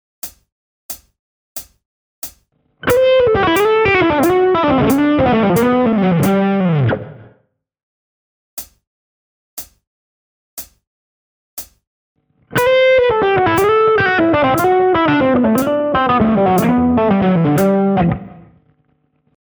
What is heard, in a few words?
legato